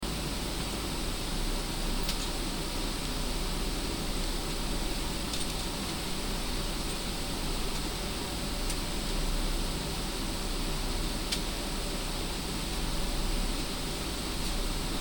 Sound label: sound in airplane